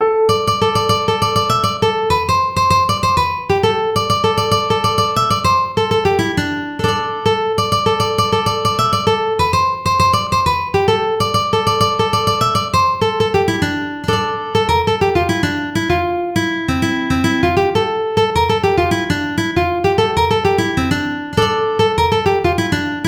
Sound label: Da Full Rigged Ship Uke